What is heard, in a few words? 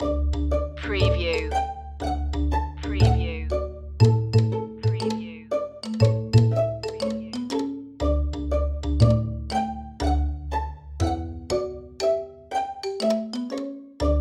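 instrumental loop; bobbie shaftoe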